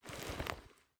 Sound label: sight lower